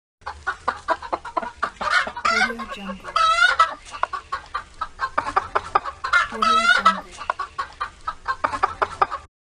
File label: chicken coop